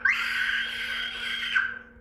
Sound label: Scream